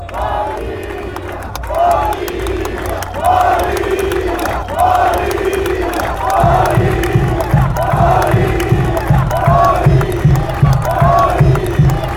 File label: bolivia slow version fanchants